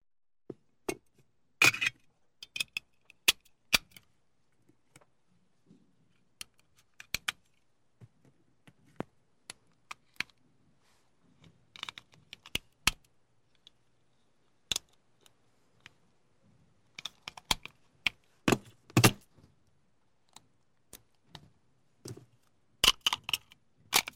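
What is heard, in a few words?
semi-automatic .45 caliber pistol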